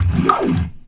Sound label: GLISS, ORGAN; Keith Emerson Signature Series